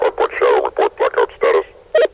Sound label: combine radio